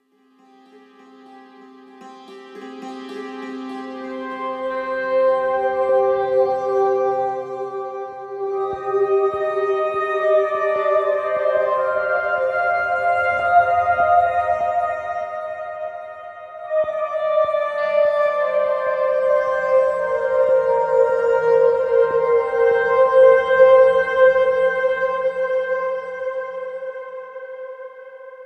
The Western Approach Explore; The Western Approach Explore Intensity 13

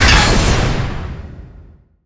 Land Normal High

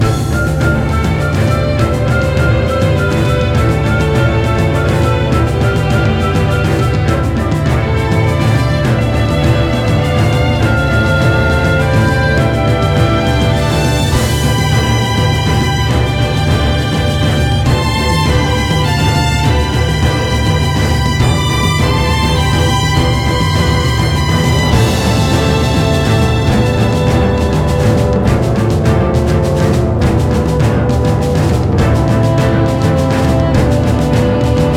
Suppression Battle Loop

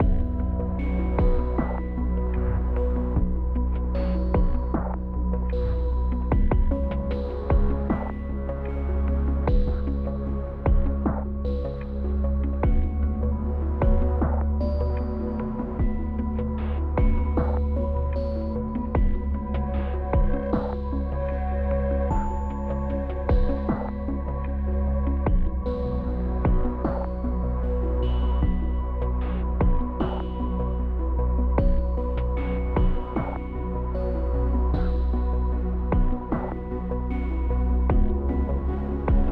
Exploration Night 8CH 76BPM

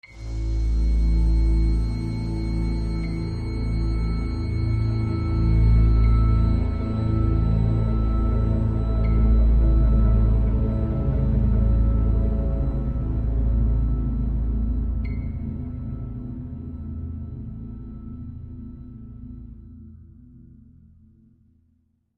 Linear Suspense; the walking dead season one

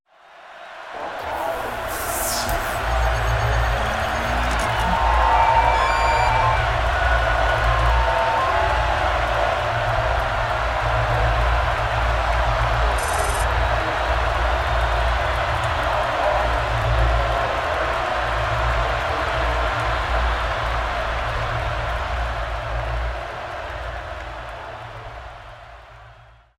SPLASH SCREEN AMBIENCE; gamerip; Wipeout Merge; Android, iOS

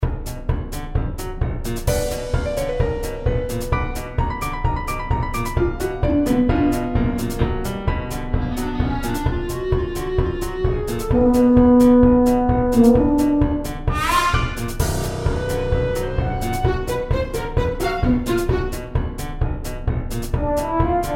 Creatures Musical Style; Castle of Pixel Skulls

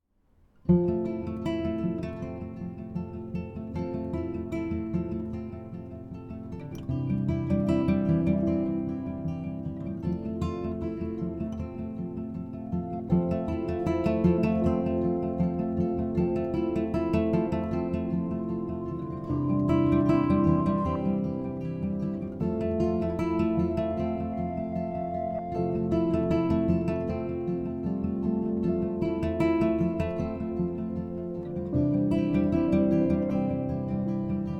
music junkyard

